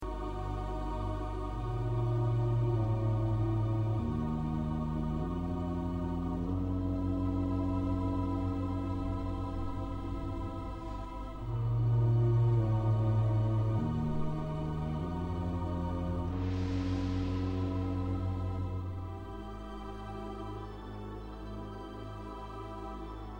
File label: monkey contra